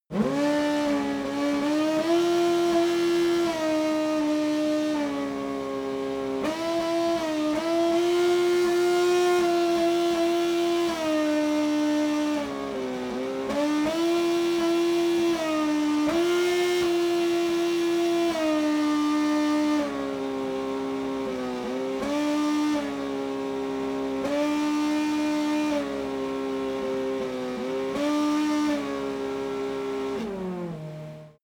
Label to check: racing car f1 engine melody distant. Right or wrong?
Right